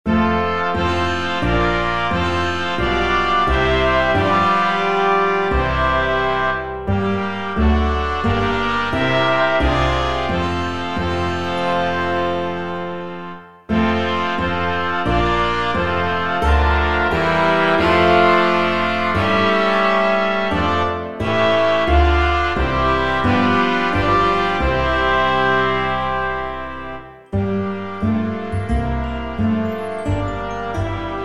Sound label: jazz ensemble